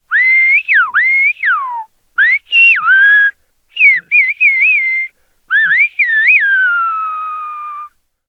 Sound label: Ringtone